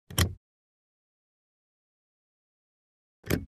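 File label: opening closing car glovebox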